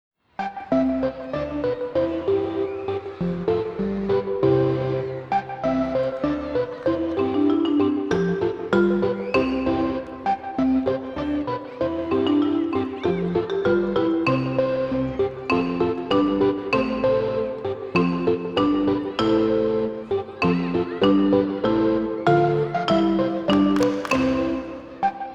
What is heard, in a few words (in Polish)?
wersja instrumentalna; EduMuzArte